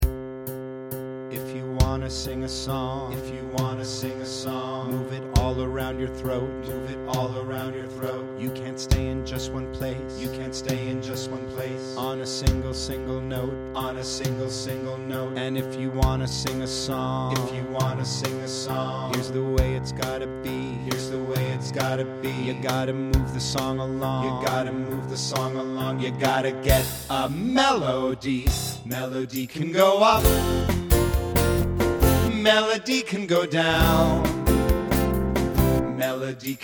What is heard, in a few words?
Melody